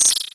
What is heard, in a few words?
Bunny Hood Turn; Turning around while dashing with the Bunny Hood